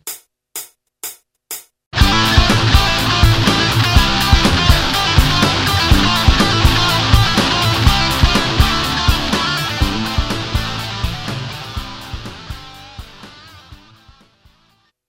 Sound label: lick, guitar